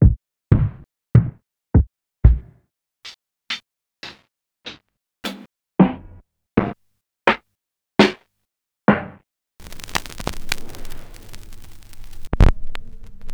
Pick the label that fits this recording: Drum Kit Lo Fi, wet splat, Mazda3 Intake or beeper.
Drum Kit Lo Fi